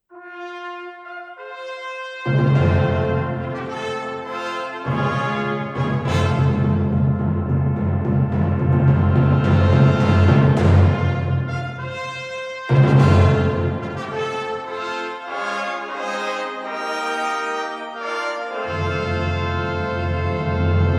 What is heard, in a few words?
Nordic Fanfare